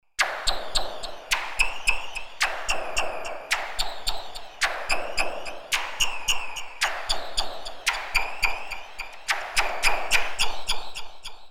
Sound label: perc noise, synth